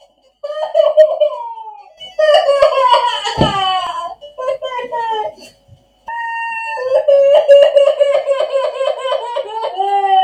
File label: long crying